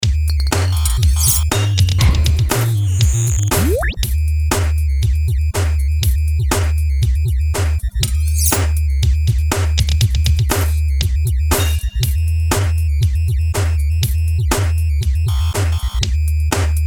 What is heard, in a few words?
Clunky (instrumental)